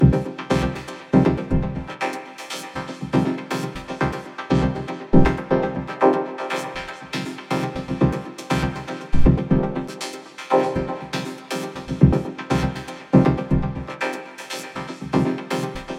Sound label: vocoder chords